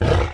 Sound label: savage attack